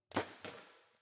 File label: archery foley arrow impact far